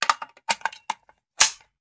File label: Machine Gun Foley; Sound Ideas; Guns